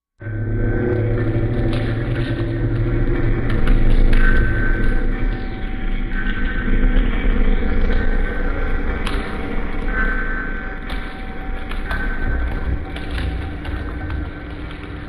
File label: Moving Underground Low, hollow